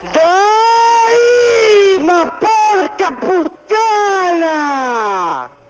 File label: rage